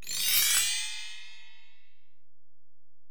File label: Alesis DM-PRO AcPerc; 300 Drum Machines